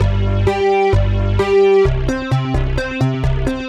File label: Mixed PWM A 130 G, modular 130bpm; modular madness